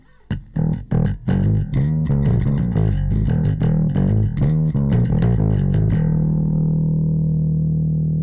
Rock Bass; Xtressor; bass preset

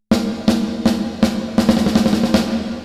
Reverb diffusion low

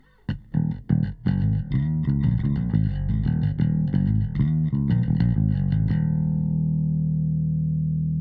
Mu limiter threshold Bass

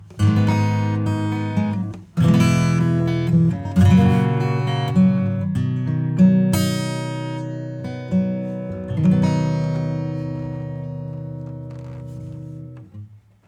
acoustic Punchy; All Punchy Hot Purple; Kelvin

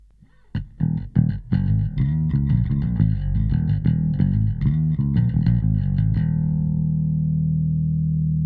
Bass bypass bass

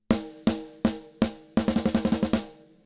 Snare Bottom Control; Pulsar 1178

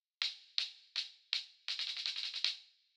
snare Up High; Valves

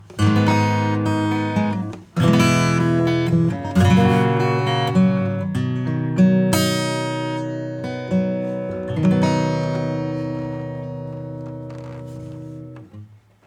Acoustic

